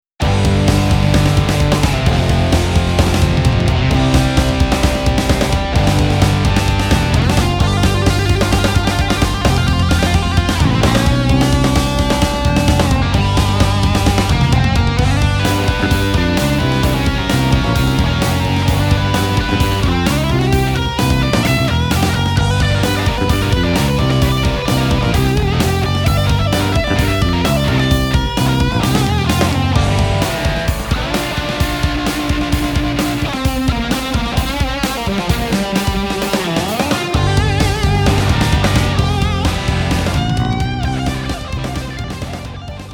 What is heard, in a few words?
fat 60s